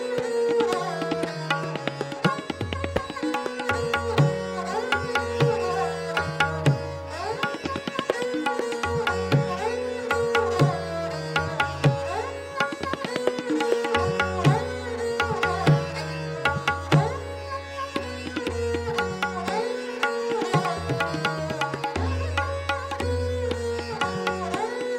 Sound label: Jap Taal - Tabla